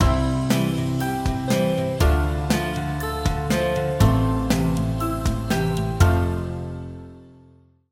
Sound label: Acoustic